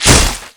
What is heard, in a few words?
blade slice; tf2classic